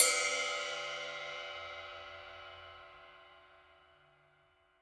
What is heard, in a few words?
Ride